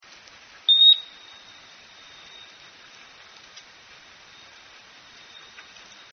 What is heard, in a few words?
submarine single ping, sonar; USS San Francisco